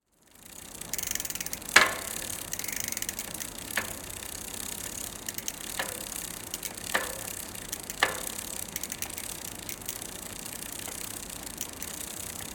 Bicycle, Chain, Wheel, Spining, Accelerating, Deselerating